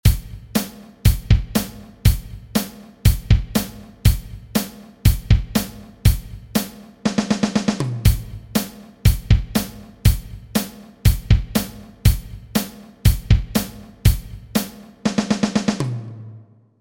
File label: fill in